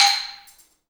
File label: BALAFON; BALAMBO; Heart of Africa